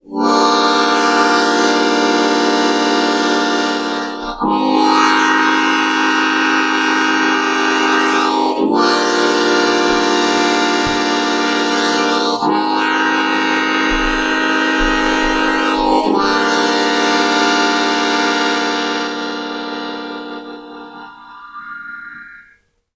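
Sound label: breathing, harp; Seydel; pulmonica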